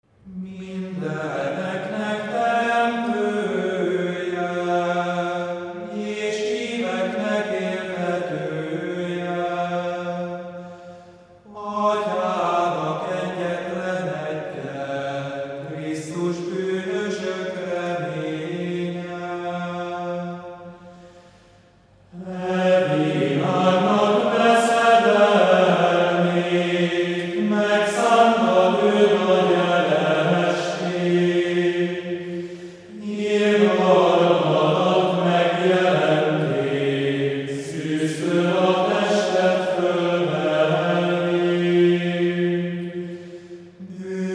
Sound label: Gregorian chants; Advent 1; Adventi enekek